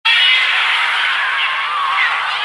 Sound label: roar; Troidon